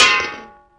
item vend

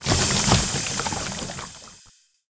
item liquid tool sample

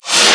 force throw start

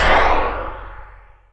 force strength end

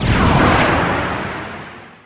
blind off

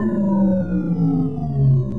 transfer shield stop

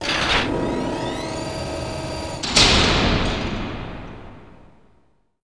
door blast close

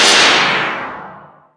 force throw end

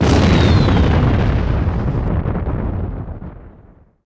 explode ywing

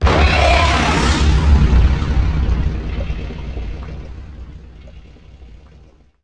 explode

